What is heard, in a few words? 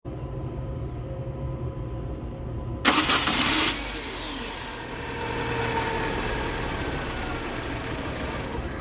sl1 intermittent high idle bucking; saturn s series sedan